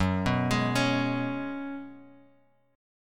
F sharp Suspended 2nd Suspended 4th, Bass Standard; arpeggiated; JGuitar